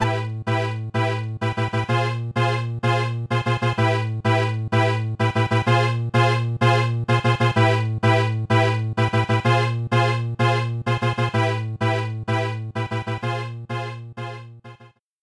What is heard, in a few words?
Coliseum